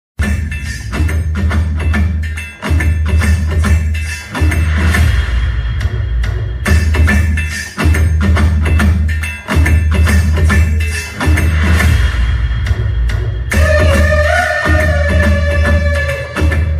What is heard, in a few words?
title card bgm; raayan; dhanush